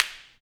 Snares, Clap n Snap

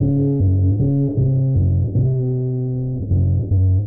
bass, Loops

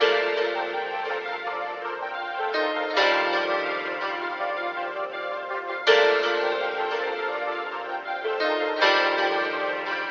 keys, phonky loops

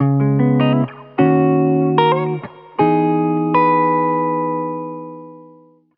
guitar, Jazzmatazz loops

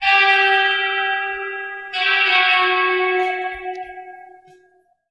guitar, Loops Sad

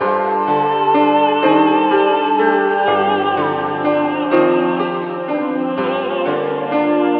piano and voice, sad loops

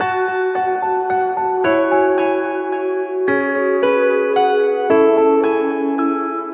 piano, Loops Sad

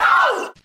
ow

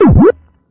mario hits bricks; Zaps and Beeps

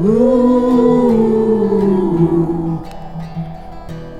guitar, Sad

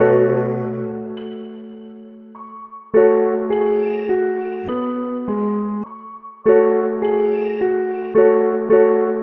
piano, Loops Sad

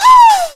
"woo", male

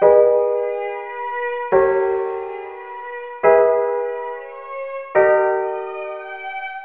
piano string undercurrents, sad; Loops

